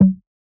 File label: Vintage Percussion, Tone; Cymatics; Mystery Pack Vol 4